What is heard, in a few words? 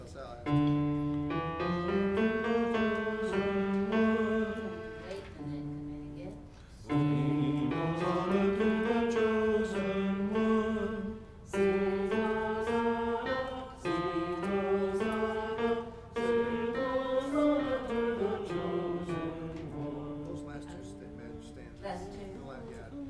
tenor and bass; 496 Palm Sunday Processional; Gather Hymnal